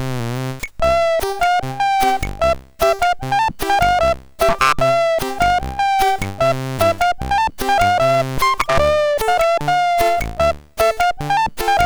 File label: Ringtone Arabic, MOS6581R4; Nokia